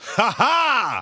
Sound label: taunt haha; Counter Strike; CS:GO; taunts